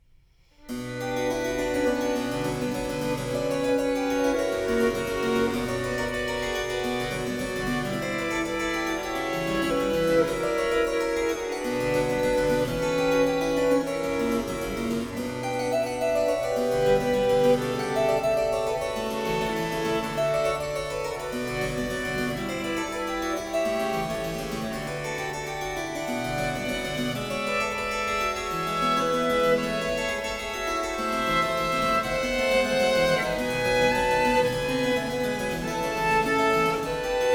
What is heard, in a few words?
Sonata No. 5 in F Minor, Adagio, Violin and Harpsichord; BWV 1018; Bach; J.S. Bach